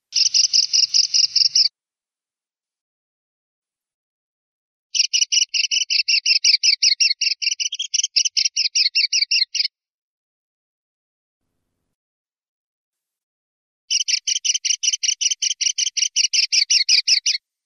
cernicaleos en quicreos, pardillo

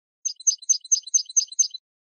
tableteos

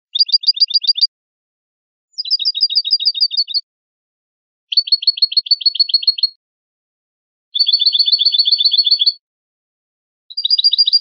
bibleo acascabelado

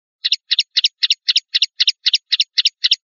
rep primaveras, pardillo

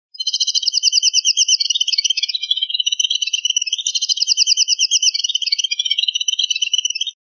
rep bailoteos, pardillo

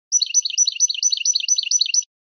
campanilleos tili tili; campero

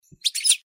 picolio, campero

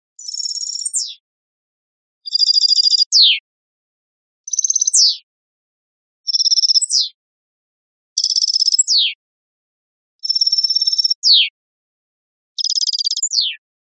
terminacion rechio

